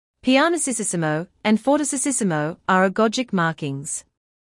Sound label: Pianissississimo